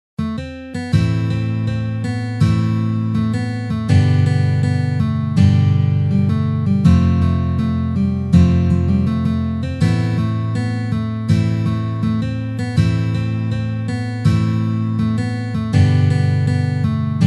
Fast Tempo, guitar

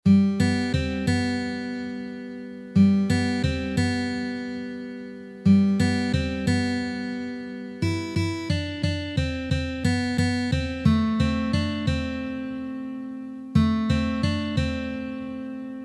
Intro Slow Tempo

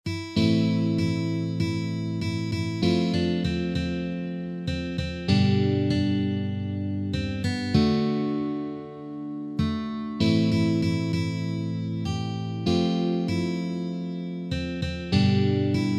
Verse Slow Tempo, guitar

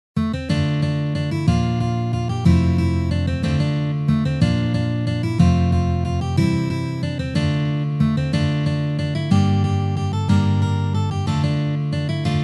Fast Tempo, guitar

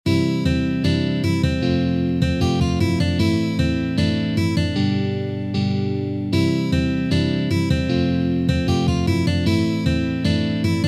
Fast Tempo

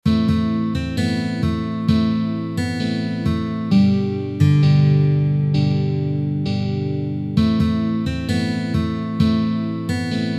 Chorus Slow Tempo

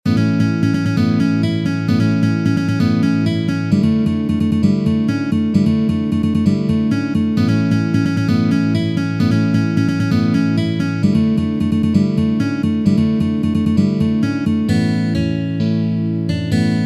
Fast Tempo

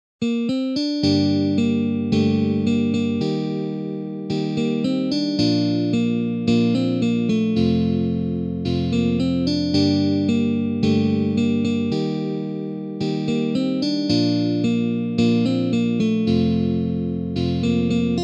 Fast Tempo, guitar

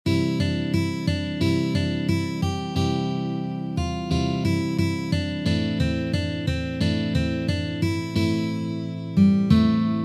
Chorus Slow Tempo

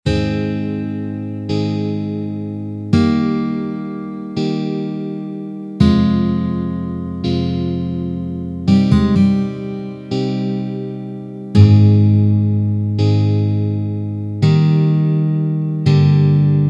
Verse Outro Slow Tempo, guitar

